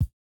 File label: no effect j city pop 1980 kick